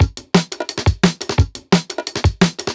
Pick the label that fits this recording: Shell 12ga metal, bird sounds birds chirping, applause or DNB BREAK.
DNB BREAK